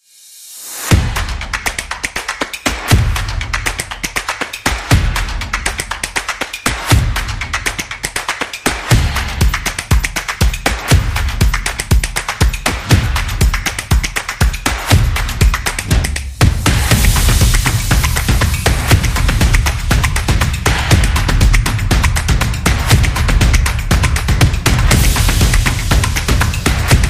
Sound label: Action Percussion